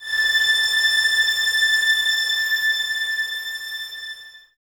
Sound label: violins A 6, string ensembles; Miroslav Vitous